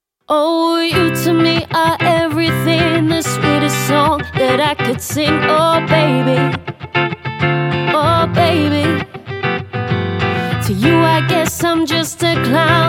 acoustic

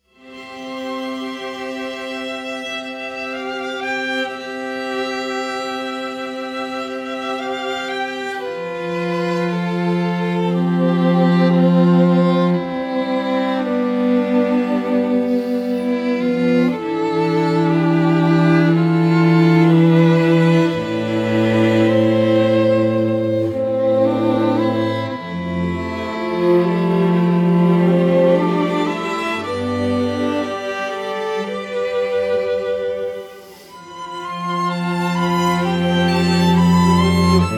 quartet

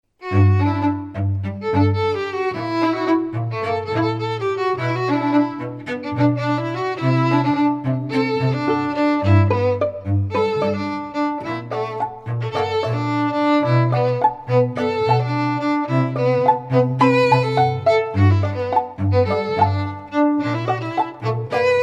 strings; classical musicians

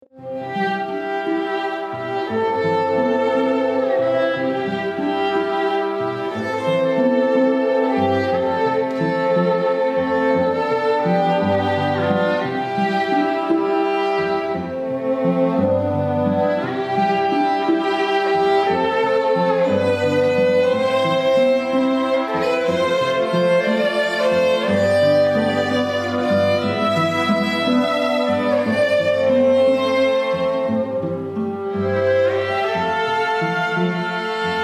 strings

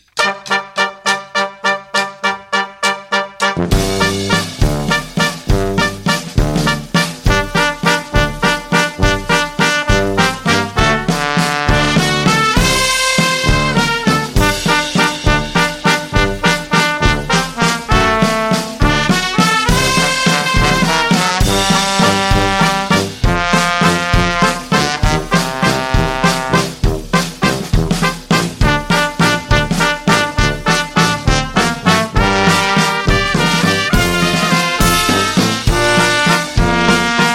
brass

